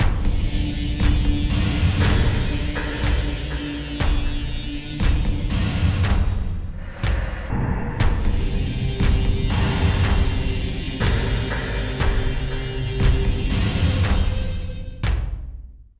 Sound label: Danger Tonal; Insanely Twisted Shadow Planet